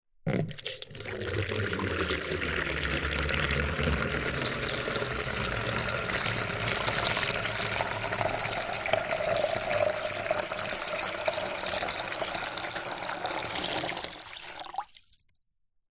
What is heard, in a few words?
foley liquid water pour into empty plastic container